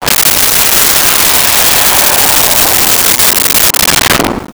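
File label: Missile Fly By, Weapons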